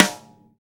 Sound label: WD Rim, Roland BK-5, Snares